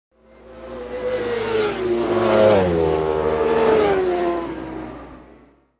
Motorcycle Road Racing

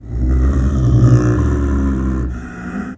Leopard Snow Growls; big cats

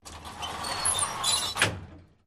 Elevator Gates Doors

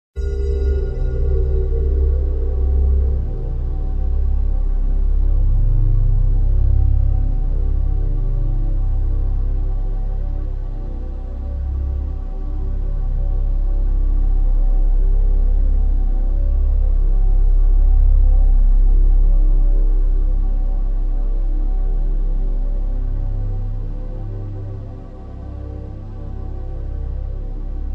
Bed Celestial Phanto

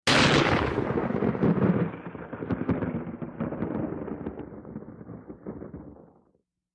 Sharp Thunder Crash R

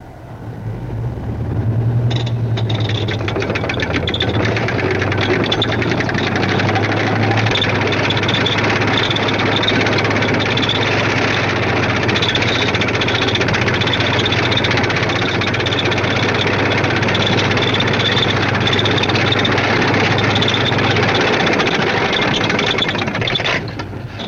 Tank Strt Run OB Stead; tank mvmt

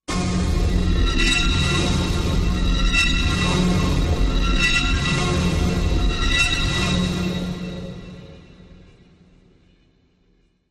Orchestra Scraper Mac, metallic

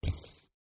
Flesh Stab, gore stabs; gore stabs & eat

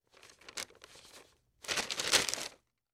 Parchment Paper Hand

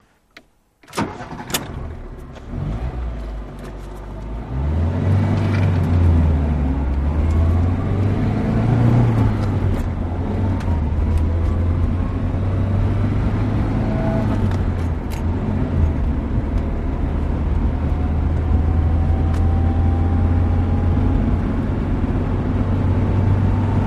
Jeep Chrke 84 Int Star; jeep cherokee 84

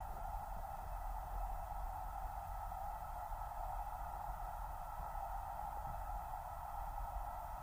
Torpedo Jet By, torpedos

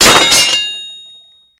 Metal Impact Clang

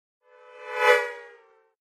Car Horn Doppler By

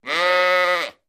Sheep Baa

